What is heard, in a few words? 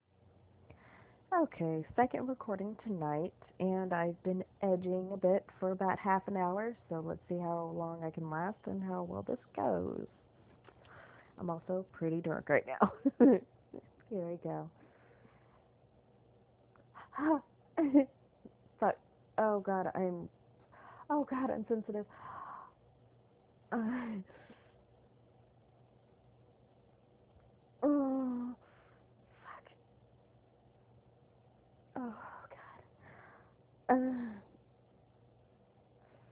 screaming, wet noises; what happens after I edge